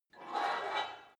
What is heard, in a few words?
take off weight barbell quick, fitnessstudio